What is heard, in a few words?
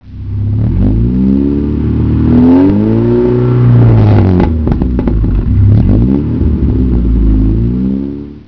spitfire; spitfire blue